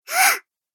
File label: Gasp Cartoon Game Voice